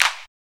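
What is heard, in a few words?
CLAP; E-Lab; X Static Goldmine 1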